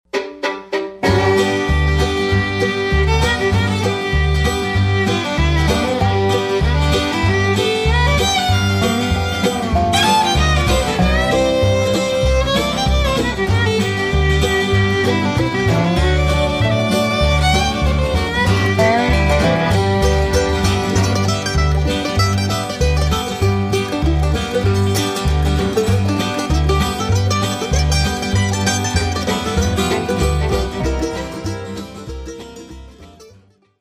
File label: Country; honduras musical 9no grado